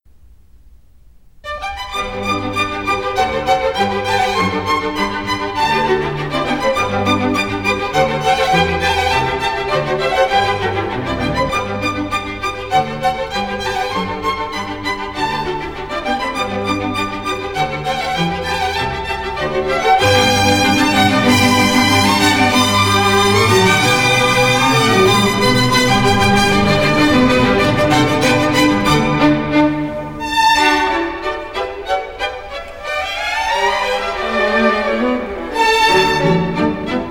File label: classical; streampal